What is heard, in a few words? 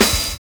FILL; Univers Sons - Kitch 70s